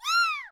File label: Valkyrie Attack Vocals